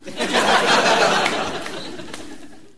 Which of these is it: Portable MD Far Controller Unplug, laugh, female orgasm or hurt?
laugh